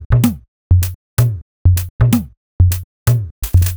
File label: Solid Top C# 127bpm, House Drumloops; 90 s Sample CDs; Zero-G House Fabrik Drumloops; ZG Drumloops